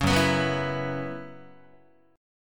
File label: Gb 7th Suspended 4th Gb down Guitar, chord